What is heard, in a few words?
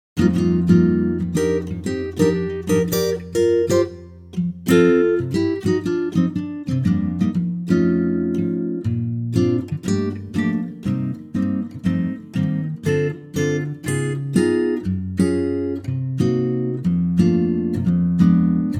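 acoustic guitar B flat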